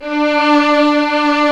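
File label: strings, 8pc; String Master Series; Vlns 2-5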